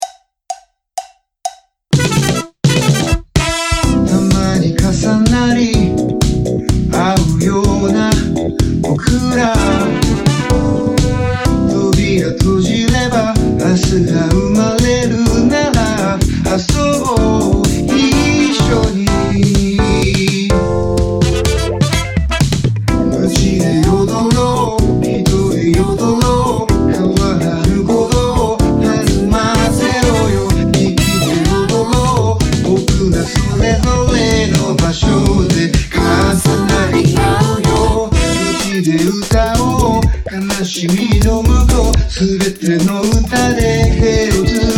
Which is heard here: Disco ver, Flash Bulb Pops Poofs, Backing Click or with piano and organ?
Disco ver